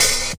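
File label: hihat open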